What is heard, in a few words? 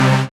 Bam Hit, Roland BK-5; Loops & Phrases